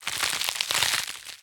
bone fall; Rapids

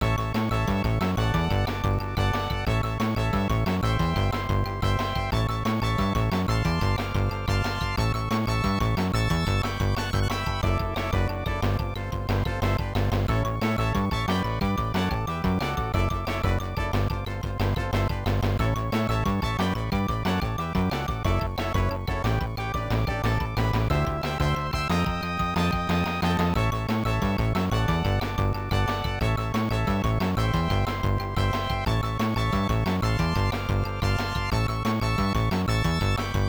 Set Match BGM

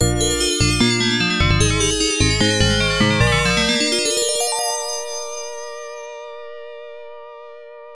Boss Clear; Wiz 'n' Liz; Genesis

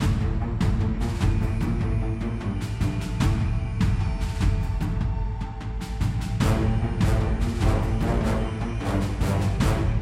BGM Battle; bellatia; GATA

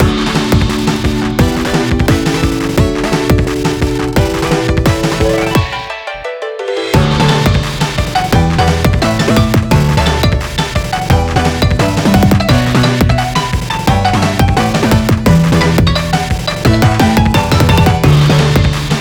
BGM Training

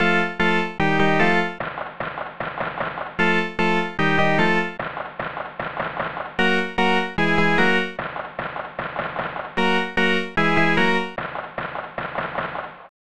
Stadium Organ; ESPN National Hockey Night; snes

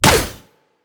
Weapon Shoot

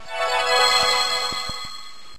Healing Pulse

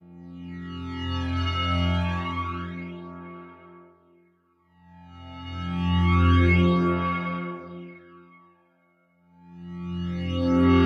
Healing Star Loop; we are the dwarves gamerip 2016

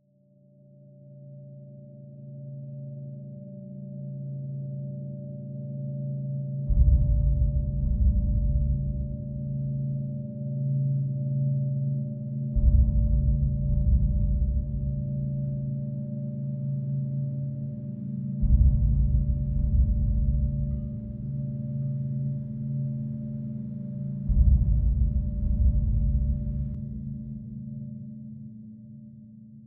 Dark Scary Explore Mood

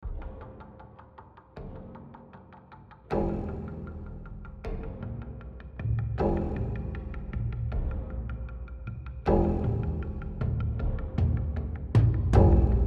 Rage Combat; The Last of Us

